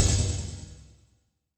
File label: C1, Synth Leads